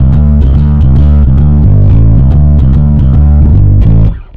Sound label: Distorted D, Bass; Effected; Bass X